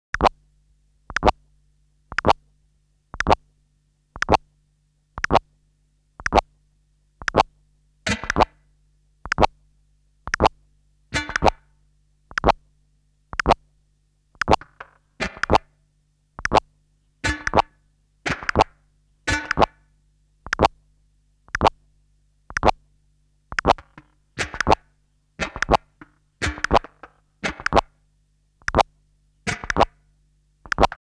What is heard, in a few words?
Frog Scratch Sequences; Bizarre Planet